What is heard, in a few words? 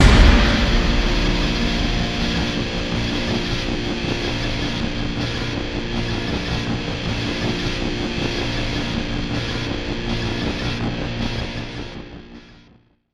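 GUITAR LONG; GTR; gamerip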